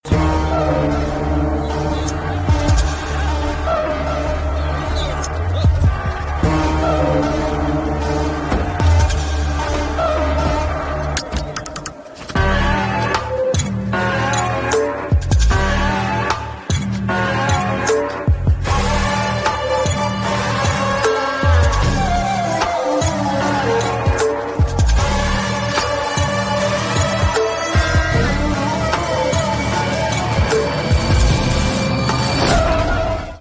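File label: background music ringtone; RDX PAULSON INTRO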